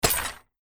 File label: lock Block